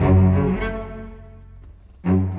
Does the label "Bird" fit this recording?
No